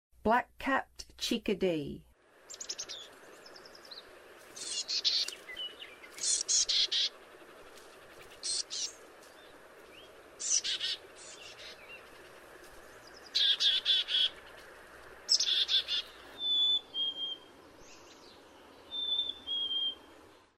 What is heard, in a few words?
Black-Capped Chickadee; North America